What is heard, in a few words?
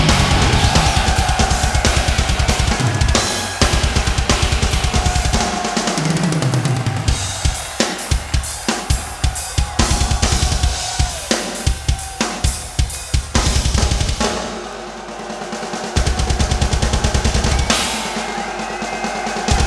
Drums solo